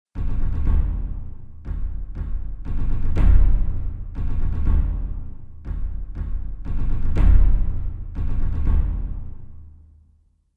instruments percussion wardrums sequenz

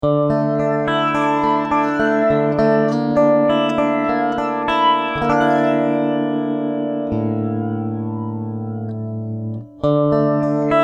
Trem Flang

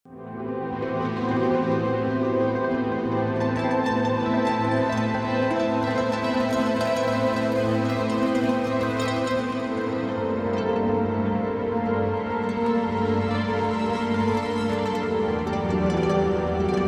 Granular Guitars Cinematic Morph Duet Split Patch; Steinberg Absolute 5

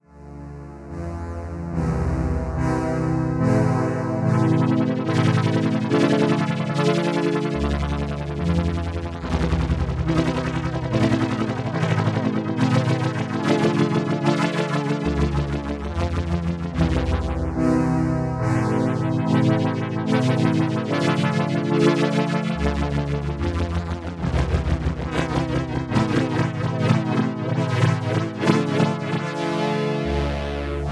Tremolo DDRM Expander

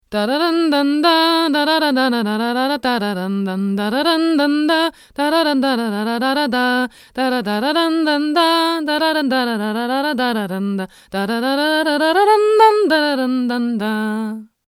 t.bone SC450 H2 near

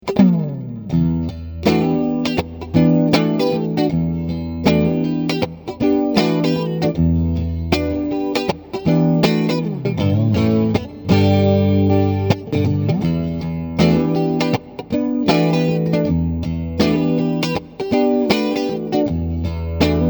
Neck Single

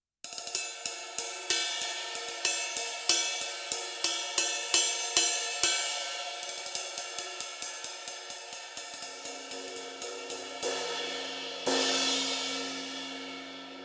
Tradition Ride

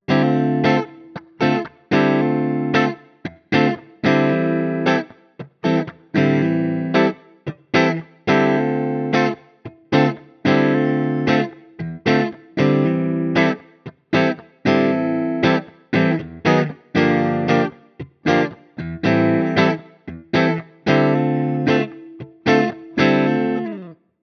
Mooer Preamp Model X US Sonic Clean Ext Cab Sim

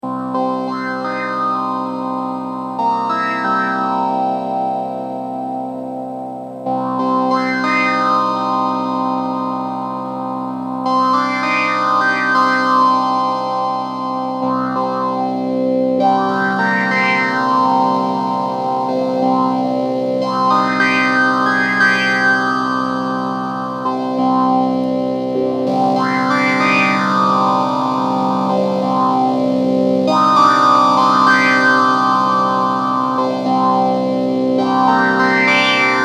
german melancholia

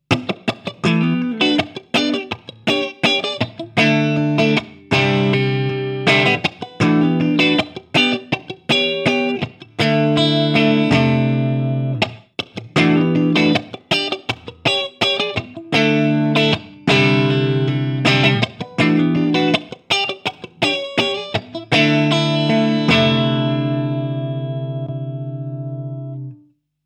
Fender Blue Channel

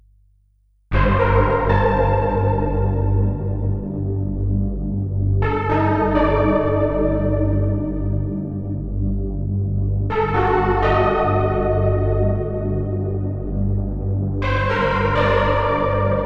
Super 6 Polysync

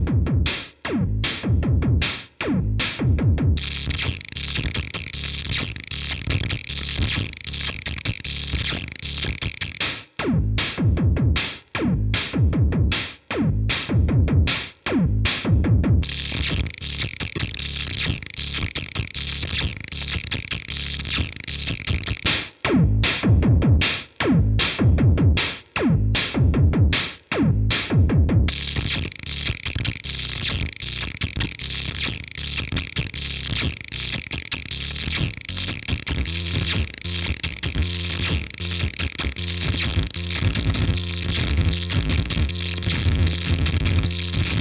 Industrialectric 4046 m Moog DFAM

